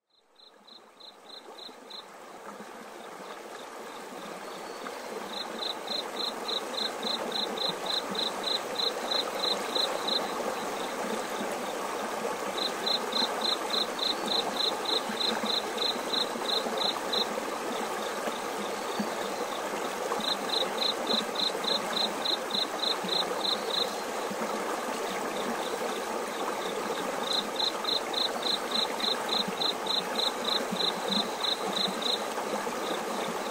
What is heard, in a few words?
Water Stream